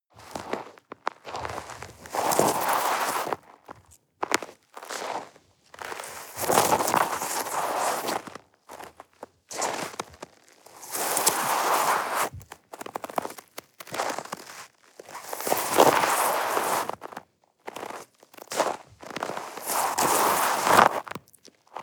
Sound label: step snow walk limp